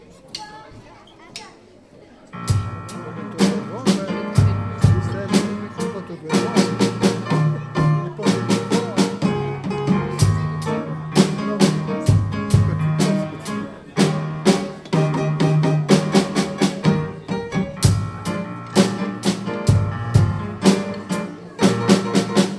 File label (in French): Blues Rock en Bb; Rois2019